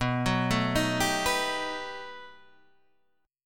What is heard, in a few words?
B 7th Flat 13th B, guitar chord